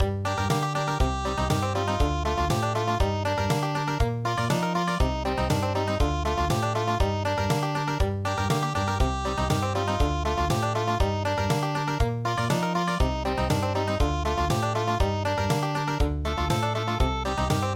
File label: Country, midi; traditional